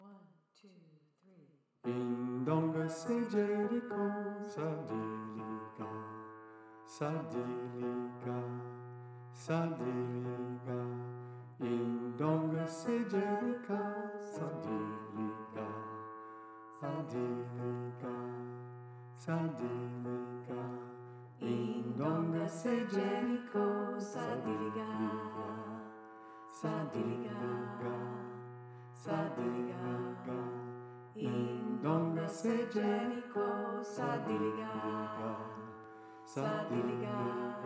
alto, choir; The Freedom Choir

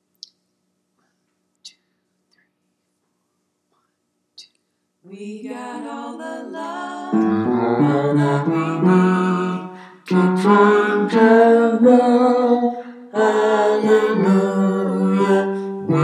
Bass

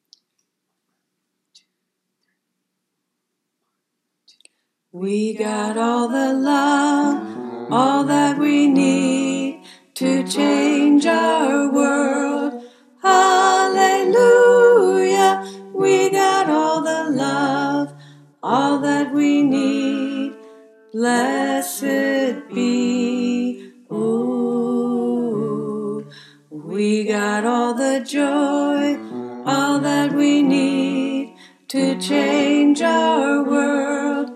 Alto

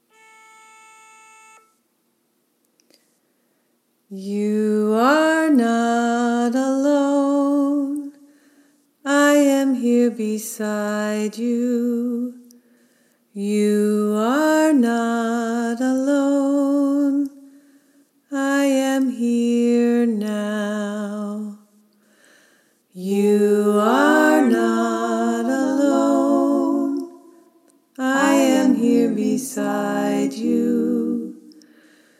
all parts, choir; The Freedom Choir

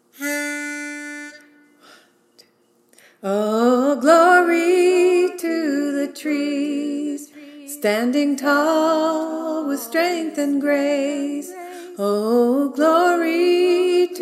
Alto, choir; The Freedom Choir

